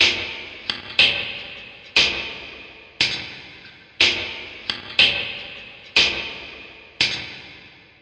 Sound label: creepy tick tock, 120 bpm